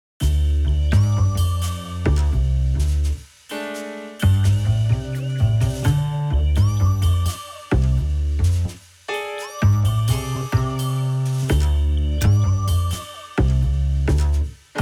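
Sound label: Continue loop; Continue Devil; Gal Gun 2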